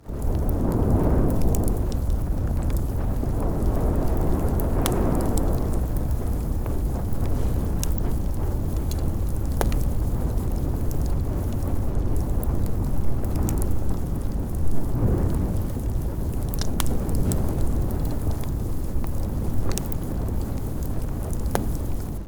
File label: FIRE MEDIUM WINDY Fire sound effects